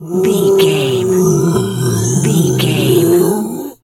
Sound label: bear roar